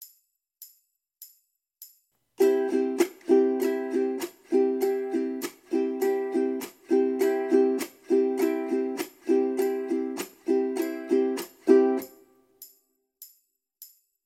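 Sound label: chnk strum, ukulele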